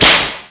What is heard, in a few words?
snare sample b key